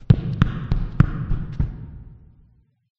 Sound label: run; CHica; Five Nights at Ronald's